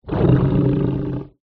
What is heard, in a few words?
Tiger Growl